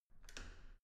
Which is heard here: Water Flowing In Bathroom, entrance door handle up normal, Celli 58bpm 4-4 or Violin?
entrance door handle up normal